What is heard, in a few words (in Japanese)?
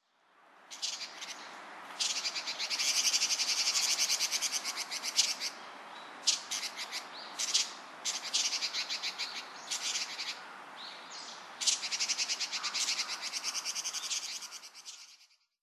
soshicho, kokubunji